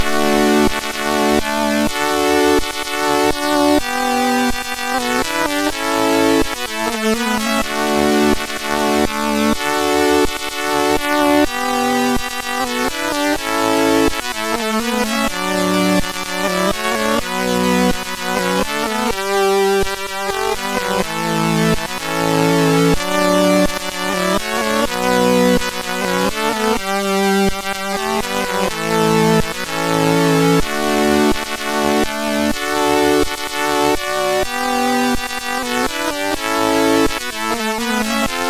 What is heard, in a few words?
sid MOS6581R3; Red Baron Birthday Disk III